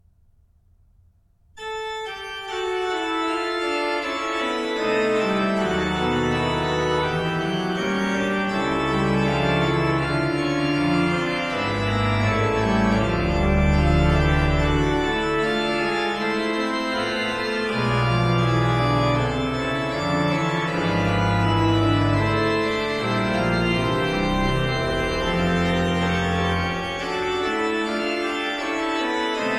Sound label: Durch Adams Fall ist ganz verderbt, BACH ORGAN, Chorale preludes; Orgelbüchlein